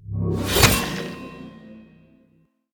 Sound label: weakening curse sin crackle; bittering rancor; lynn